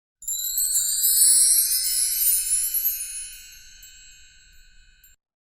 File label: EFECTO DE SONIDO BRILLO brillante shiny glitter sound effect